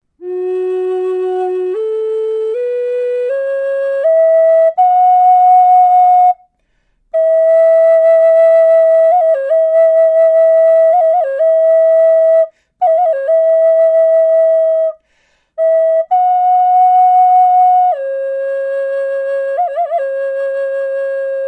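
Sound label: natural, wood flute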